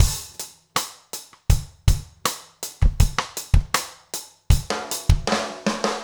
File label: trip hop rims